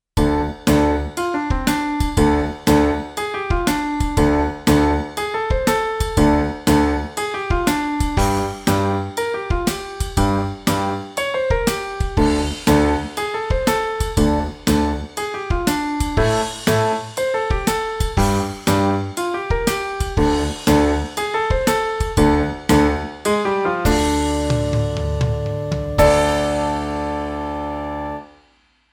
Triplet Blues

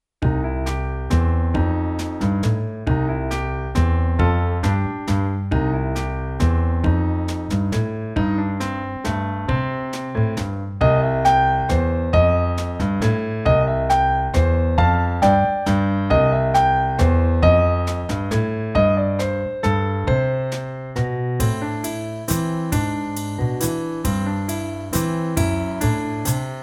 Whimsical Waltz

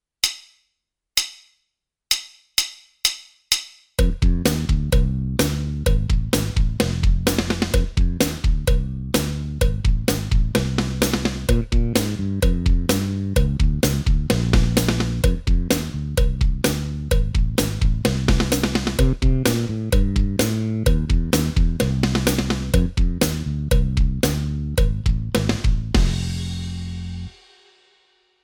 Back Beat Shuffle Track

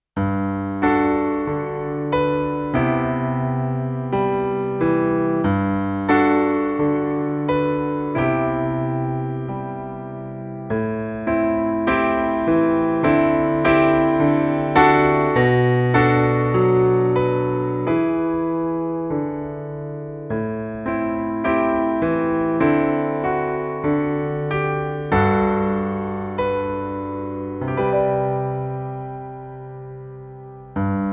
Pensive

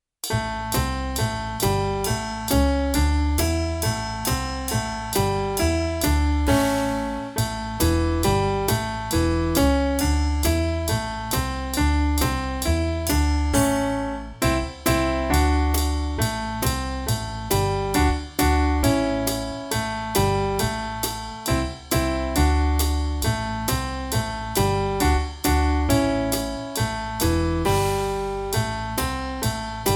Jazzy Jive

